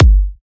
kick g key, Royality free kick drum sample tuned to the G note. Loudest frequency: 616Hz; kick drum samples; drum single hits one shots